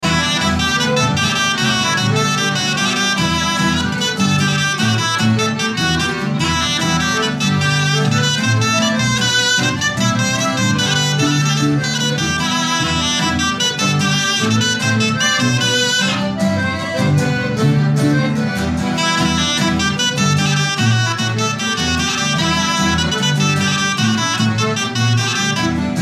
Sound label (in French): plinn bombarde